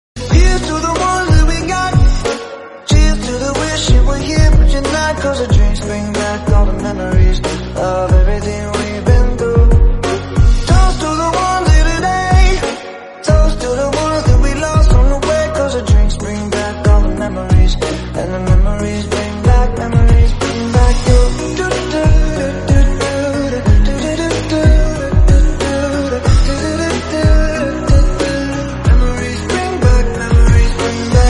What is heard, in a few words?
remix english song